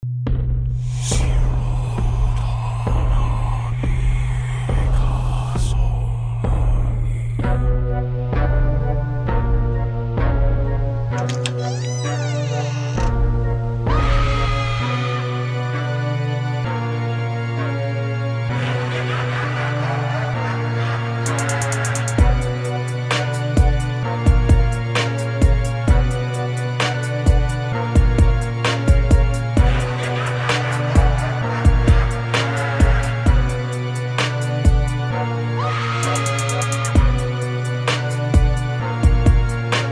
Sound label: Trap Halloween